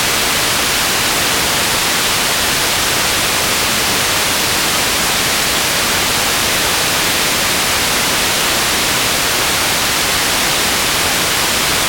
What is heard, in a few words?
radio noise